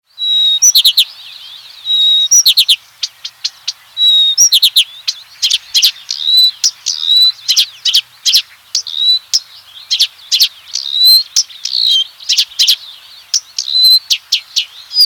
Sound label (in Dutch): Blauwborst